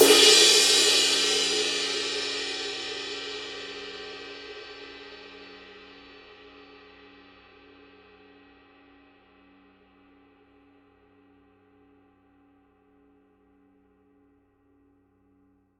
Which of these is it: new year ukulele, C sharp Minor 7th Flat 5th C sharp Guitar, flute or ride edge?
ride edge